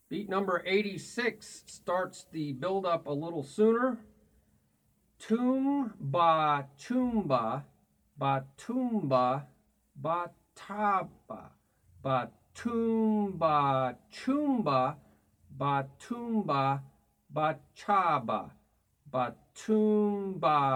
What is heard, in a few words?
Beat 1 2 e a 3 e a 4 e a Toom Ba Choom Ba Ba Toom Ba Ba Cha Ba Ba